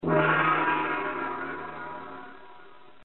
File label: timer end